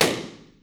Wean Classroom

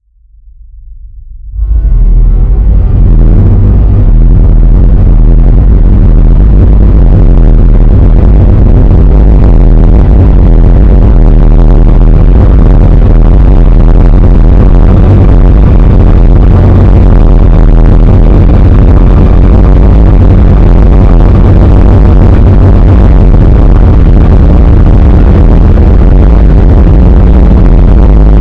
fire

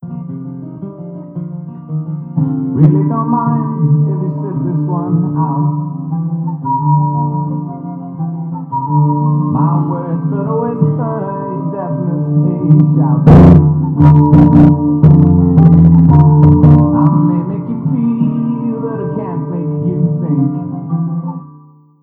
handpan

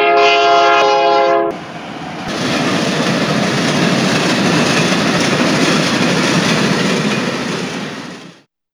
train

